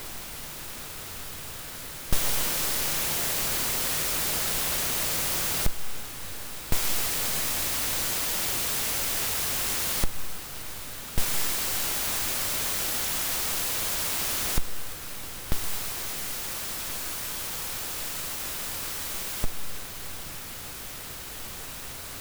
Tascam Model 2400 preamps via USB ohne angeschl MIC

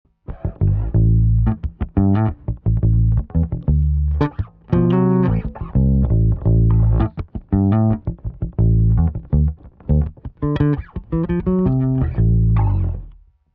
Quad Cortex Bass Clean mit Comp und Ampeg

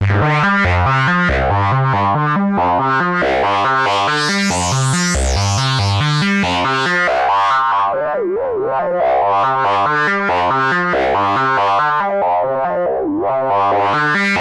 Behringer K-2 MK-2 16 tri 4 ring verschiedene Filter Einstellungen

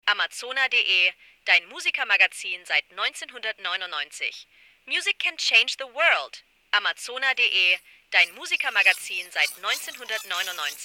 Soma Pulsar 23 Patch Signalbearbeitung

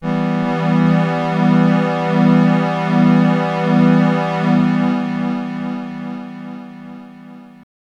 Synth Delay und Modulation; TC Electronic 2290 P

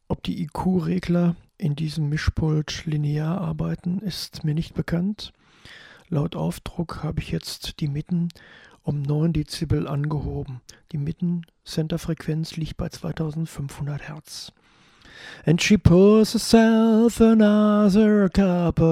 Mitten angehoben

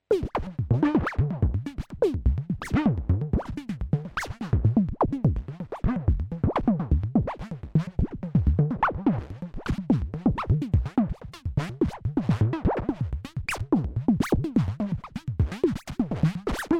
Moog Labyrinth Sequence unbearbeitet

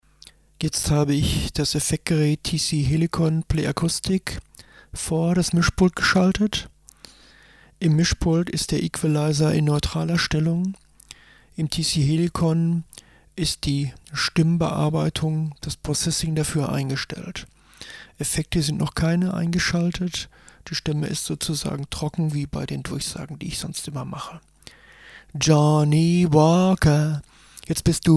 Akustik Duo; selbst abmischen

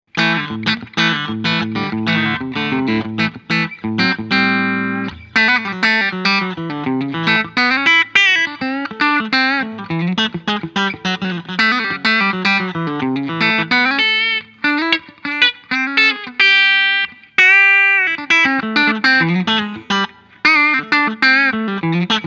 Steg clean; Sire Larry Carlton T7

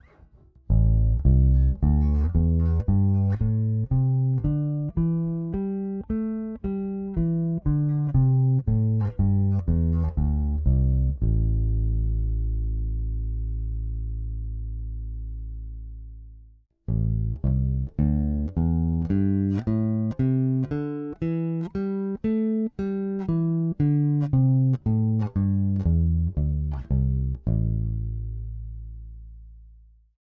Aguilar Super Double vs. Nordstrand Big Blade Neck PU aktiv

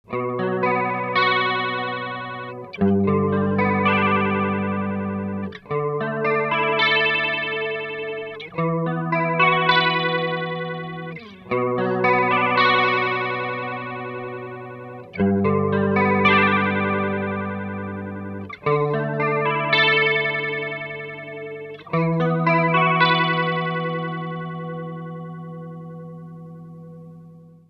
EHX WOTM color 1 Uhr range 9 Uhr rate max

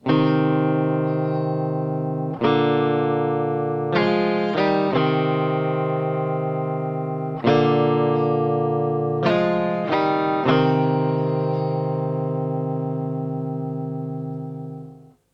Mooer Harmony X2 Harmonien

